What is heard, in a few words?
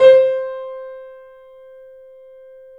C -R, The Piano; InVision Interactive - The Piano